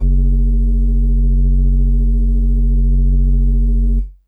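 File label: Pan Flute DX100 F#3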